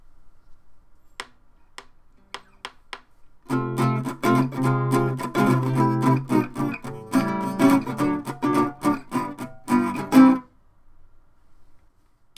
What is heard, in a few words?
without pan